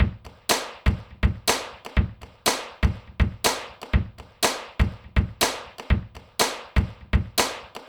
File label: drums